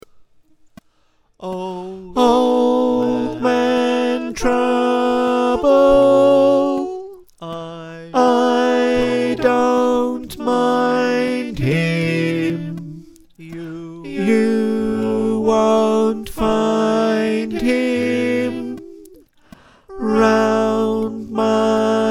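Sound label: slow tenor, choir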